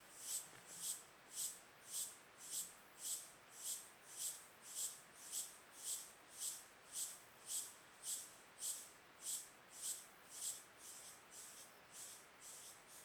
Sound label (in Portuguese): Cigarras ritmicas parecem chocalhos; insetos